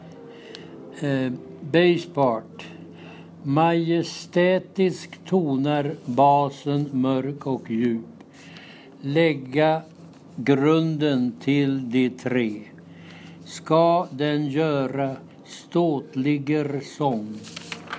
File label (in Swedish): Körtrall Bass